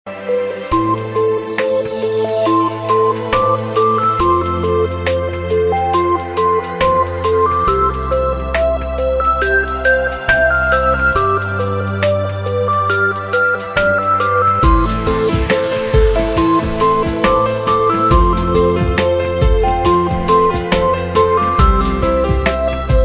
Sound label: Melody, RingTune